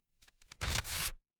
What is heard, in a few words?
paper fabric tear